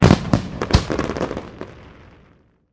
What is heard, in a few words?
fireworks spark; ambient; pvkii